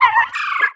idle, headcrab reviver; creatures; vox

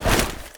teleport movement, foley

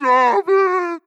idle moan dry, zombie vox; creatures

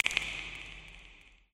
amb creature antlion click; Half Life Alyx

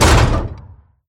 move clunk, combine train; mechanical units

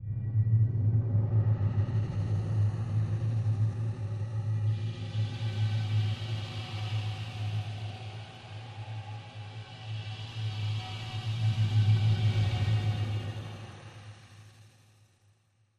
amb unique hotel street; Half Life Alyx

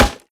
wood, bullet impacts; physics; Half Life Model Pack

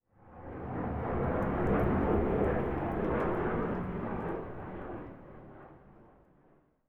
airplane, dust2; ambient; Counter Strike Model Pack; CS:GO

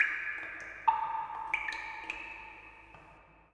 ambient water drips; Portal 2

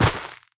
step run, footsteps player grass

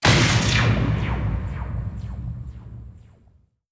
cable impact layer, strider; creatures; Half Life Alyx

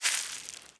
grass, footsteps; Half Life 2

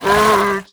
grunt, zombie; creatures

